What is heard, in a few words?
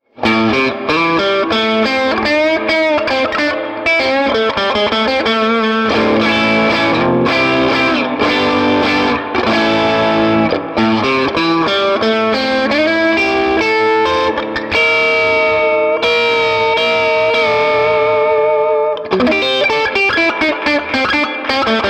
jazzmaster, Swart AST